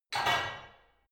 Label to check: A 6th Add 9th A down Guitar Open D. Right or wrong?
Wrong